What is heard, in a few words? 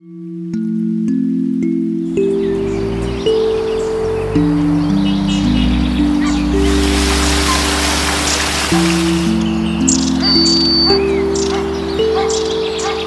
kalimba